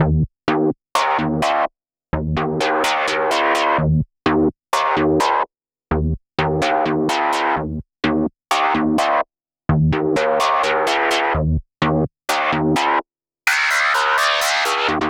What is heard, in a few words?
Synths, Underground UK House & Electro; Sonic Foundry (Sony Creative Software)